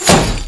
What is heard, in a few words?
hit wood; nox